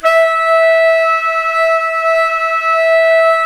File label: SAX, Tenors; Giga Samples Collection